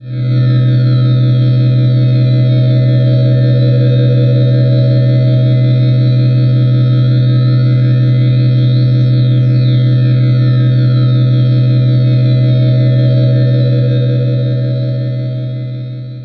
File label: Bell; Sonic Foundry (Sony Creative Software); James Johnson Spektral Minimalism; Sublime Keys; Bells